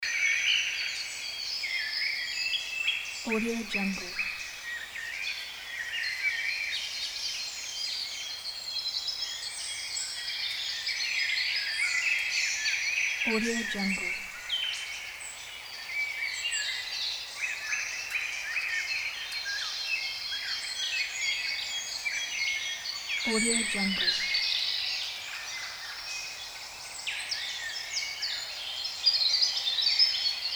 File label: birds